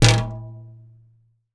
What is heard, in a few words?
wall glass cabinet; Golden Crayon Sounds